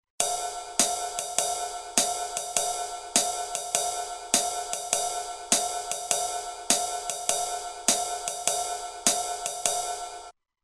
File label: 4 Beat, Roland TR-505; Factory Rhythms